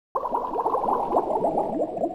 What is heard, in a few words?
Dive